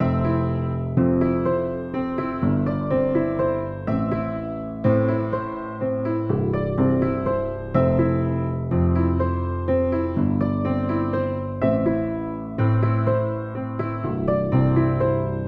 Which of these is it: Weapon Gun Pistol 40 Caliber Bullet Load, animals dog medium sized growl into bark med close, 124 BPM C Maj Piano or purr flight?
124 BPM C Maj Piano